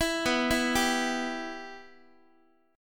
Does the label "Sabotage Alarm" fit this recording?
No